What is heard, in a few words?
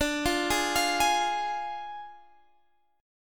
D Diminished Guitar Standard, chord; 22,20,18,22,21,x; Ddim arpeggiated